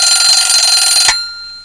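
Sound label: ALARM CLOCK; KIDSTUFF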